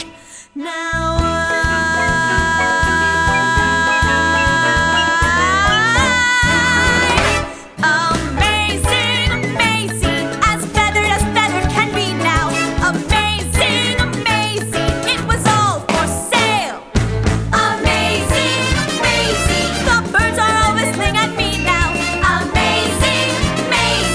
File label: Vocals; theater